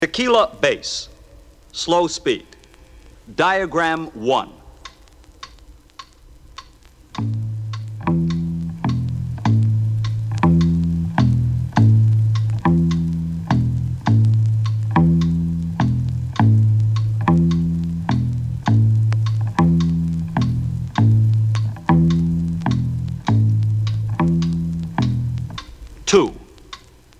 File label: bass